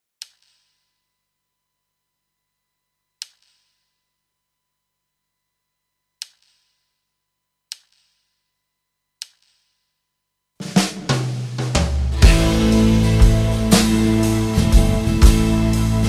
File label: style, guitar backing tracks